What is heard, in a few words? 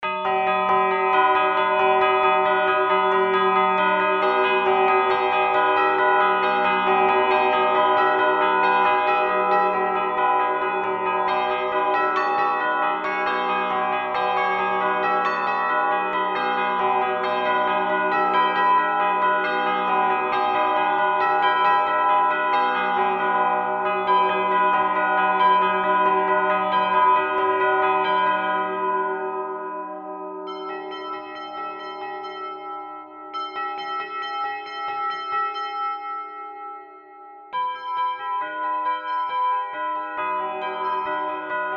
bells 12th root phi; micro; bell tuning compare